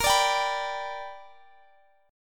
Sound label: G Major Bb; strummed